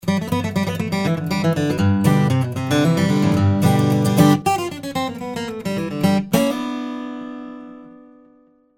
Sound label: Country